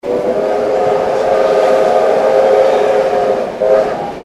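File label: steam whistle of train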